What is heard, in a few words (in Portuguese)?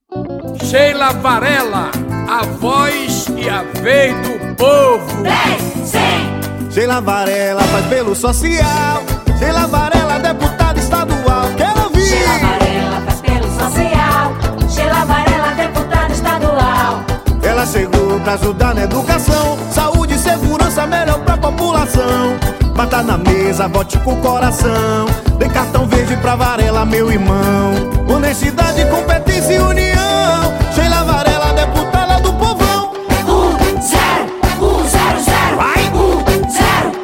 jingles de divulgacao versao pagode